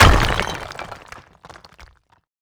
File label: rock hit